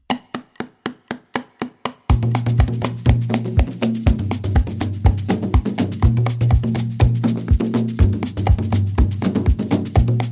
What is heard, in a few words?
melody